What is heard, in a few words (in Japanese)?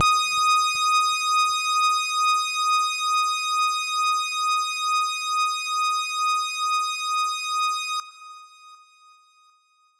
音程 女性 D5